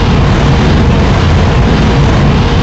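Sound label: Booster Loop Exterior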